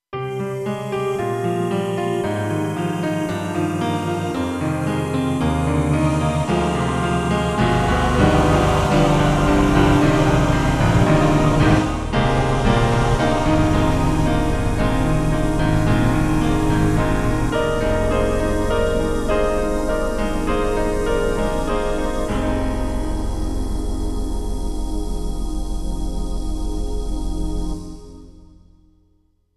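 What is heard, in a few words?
KORG M3 XPanded Combination, piano; Worship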